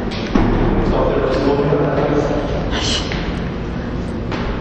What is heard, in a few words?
Shhhhhh